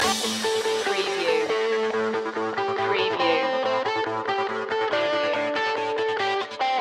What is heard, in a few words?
trashed underscore loop